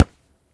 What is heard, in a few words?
kickball